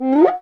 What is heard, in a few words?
PRC Brazil Set; NorthStar - Global Instruments VOL-2